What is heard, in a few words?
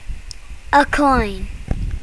coin; What has a head and a tail but no body?